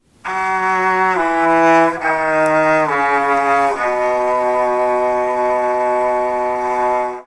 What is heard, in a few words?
cello phone